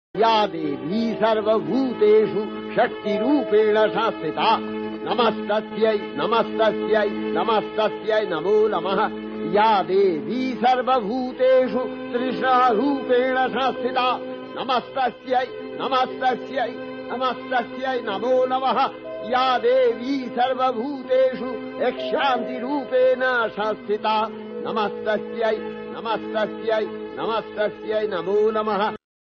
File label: mahalaya mantra